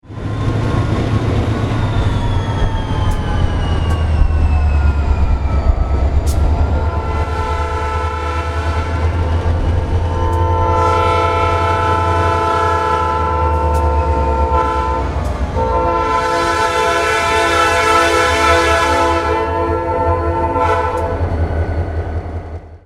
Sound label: horns; P5; CSX SD40-2